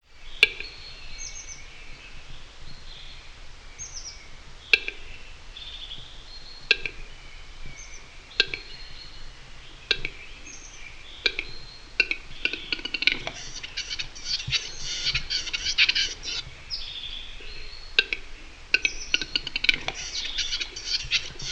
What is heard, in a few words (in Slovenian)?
divji petelin tetrao urogallus; zvoki zivali; pohorka